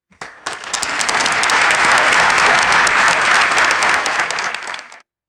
short burst of crowd applause

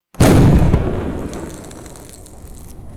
loud firework burst and crackling tail

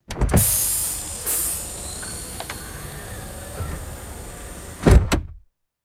pressurized air hiss from car trunk struts

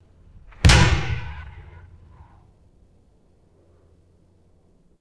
distant vintage blunderbuss single gunshot

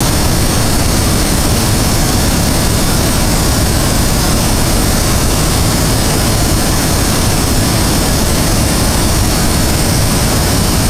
constant roar of a geothermal steam vent; liquid mud

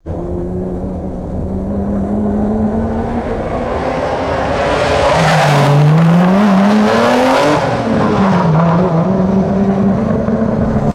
sports car passes by and accelerates; vehicles

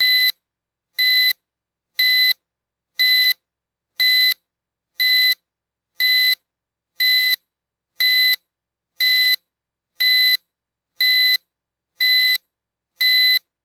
constant high pitched digital beeps

